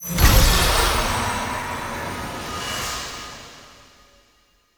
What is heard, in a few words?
Horse Protection Ability; Vehicles; Duviri